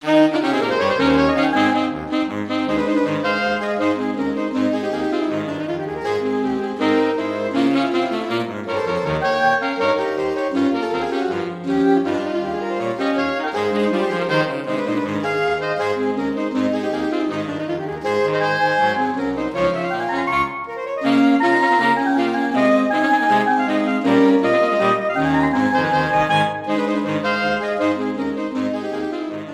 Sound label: SAX QUARTET Beginning; SATB; Fillmore; Circus Bee